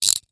Weapon Handcuffs Tighten Quickly; AdobeCC

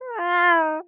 Animal Mammal Carnivore Cat Domestic Cat Meow Sad; animals; AdobeCC

